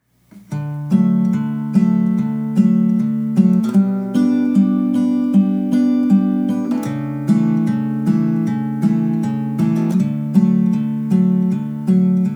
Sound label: Instrumental